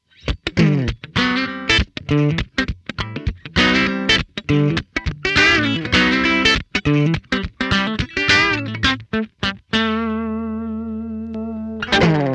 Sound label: Tech 21 SansAmp PSA 2.0 Fender